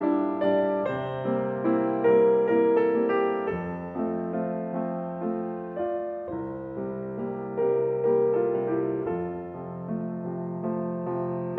Standchen (instrumentaal); Schubert

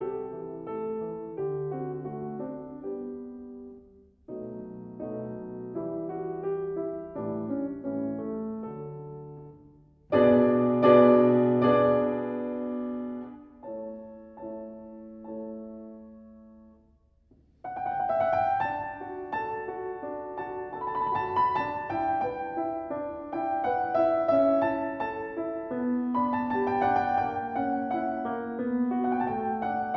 Andante con espressione (piano); Mozart

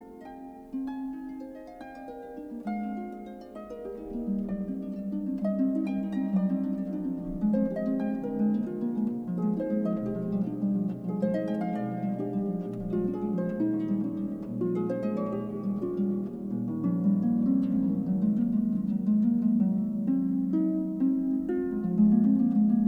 harp

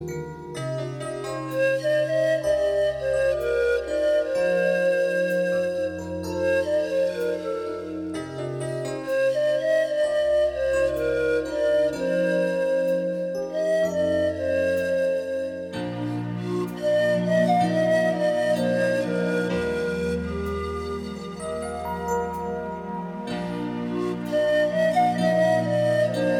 Pan pipe

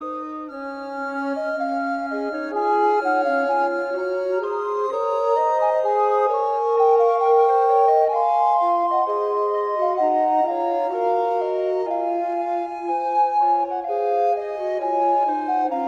Quartet

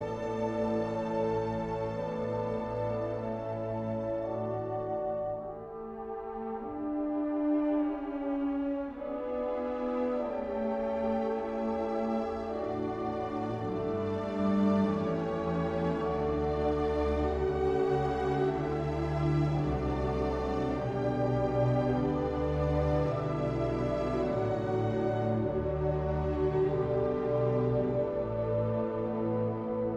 piano conc

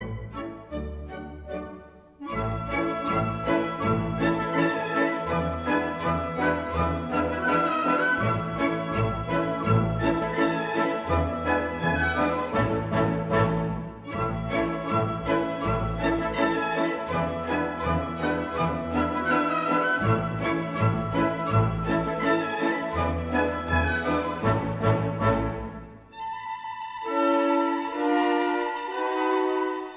Polka; Strauss; Annen; Op 117

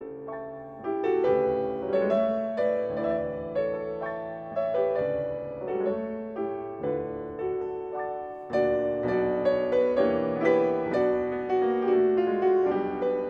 Tango

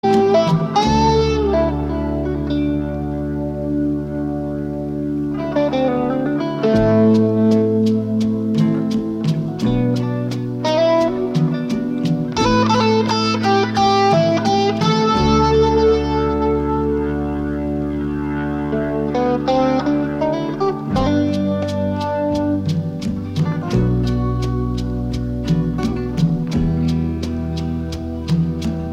Samba

